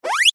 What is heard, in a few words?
cartoon squeak slip high pitched